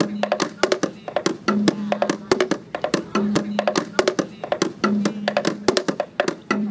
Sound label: 143 BPM; Global Influences Loops